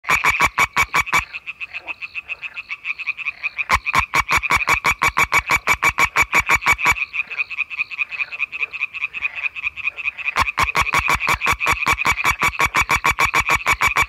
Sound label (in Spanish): ranita de san antonio